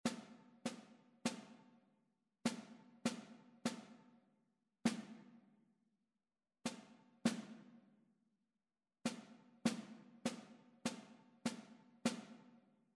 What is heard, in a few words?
rhythmic error detection